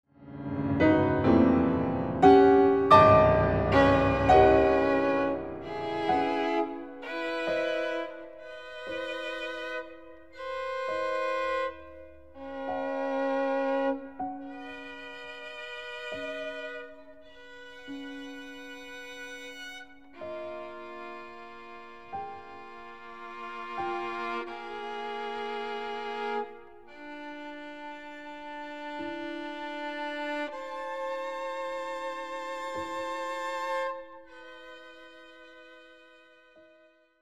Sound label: duo for violin piano